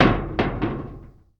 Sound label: IMPACT Metal Soft Plate Double Bounce; mono; IMPACTS; Universal Sound FX